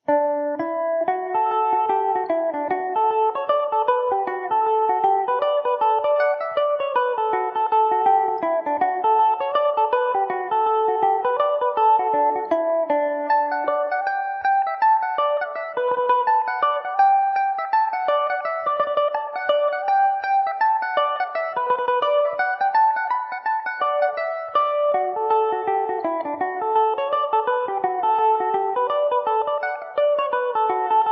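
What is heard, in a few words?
D major, Irish mandolin; The Hornless Cow; Revelation Jaguar electric mandolin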